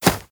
Grass Run

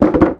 Rck Wood Hit

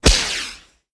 Fireworks Launch

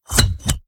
Door Button

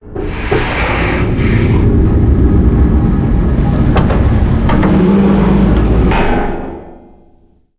Chair Descent; Vog

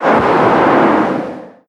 Fumerol Blast

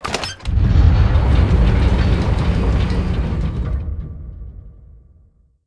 Pedal; Kalamee; moul

